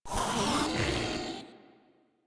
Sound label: Vocal Pain; Warframe; Proto Infested Ancient